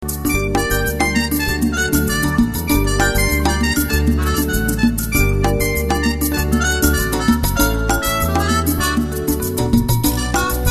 Latin Jazz

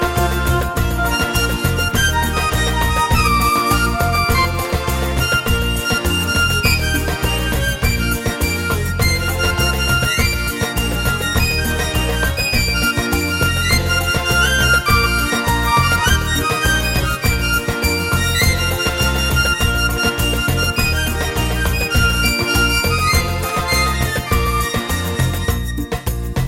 Greek Rumba

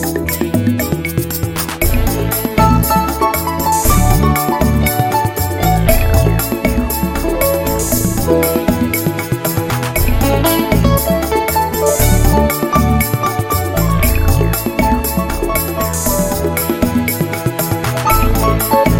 Beguine

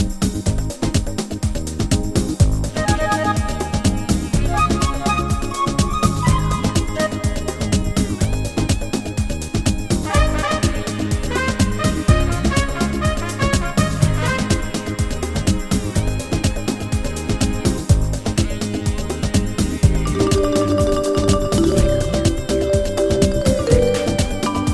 Tribal

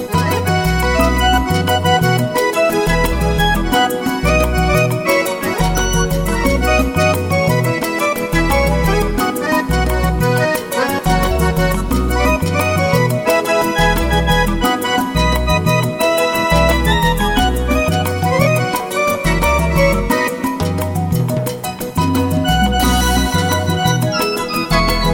Bomba

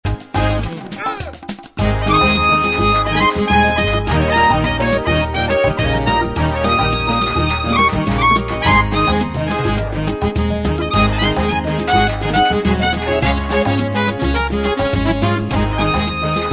Mambo Party

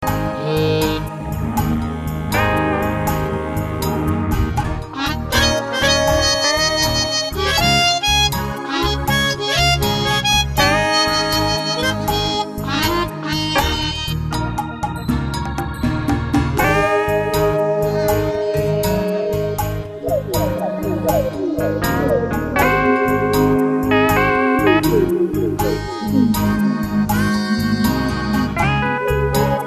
That Whistle; bluesjazz

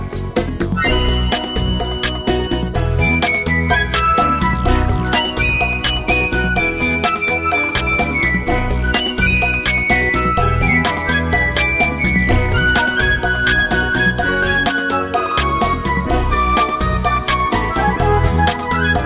Latin Pop

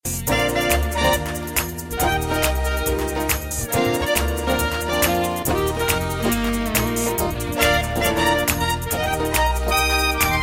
Jazz Club

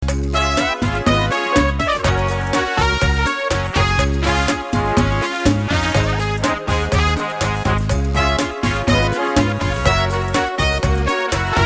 Cha Cha with Me

